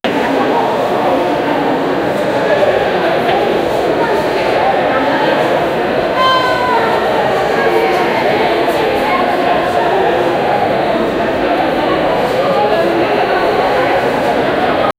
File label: the noise of the crowd, theater